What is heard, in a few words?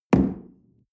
coffee place tablecloth medium, restaurant cafe